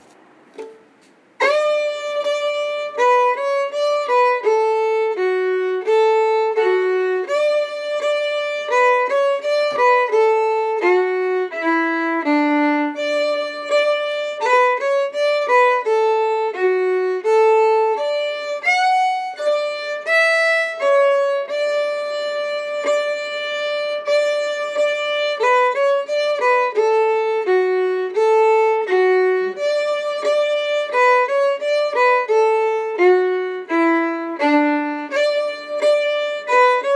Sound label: Polka Slow